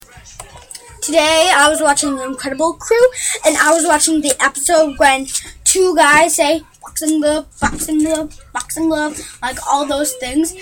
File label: boxing glove